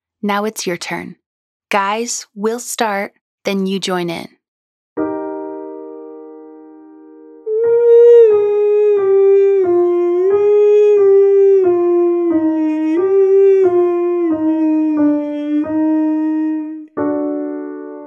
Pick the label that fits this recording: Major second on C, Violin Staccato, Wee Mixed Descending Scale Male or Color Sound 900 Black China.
Wee Mixed Descending Scale Male